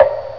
drip

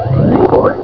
SWAMP

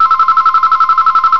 warble hi; ALARMS

